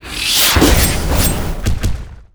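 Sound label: boss attack, monster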